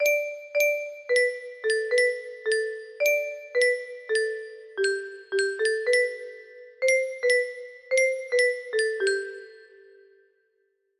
music box

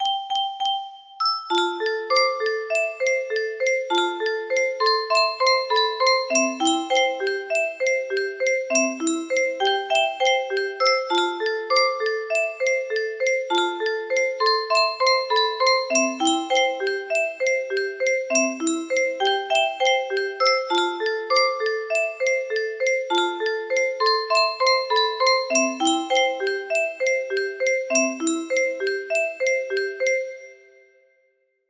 music box

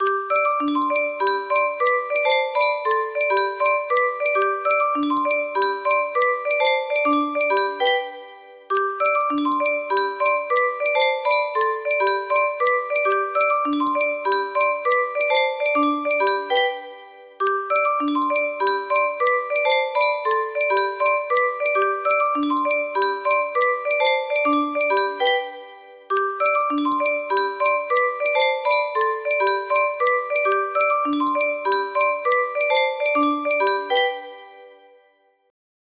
london bridge is falling down, music box

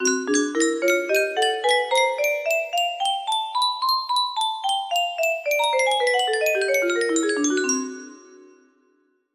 scale

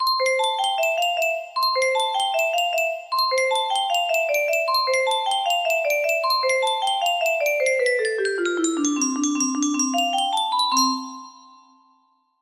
music box

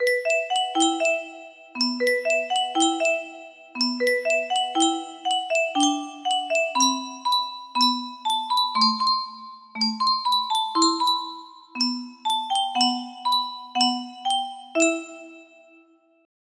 music box

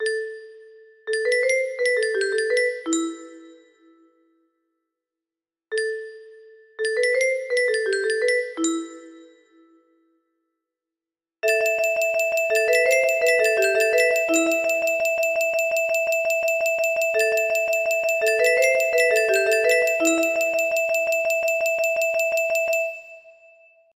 opening riff, music box

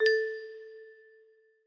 music box

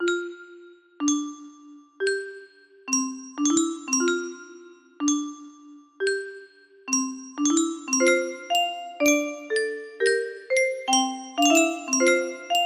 singing, music box

